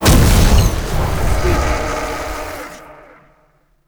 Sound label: Loot Crate Spawn Chest; Warframe; Duviri Murmur; MITW Loot Crate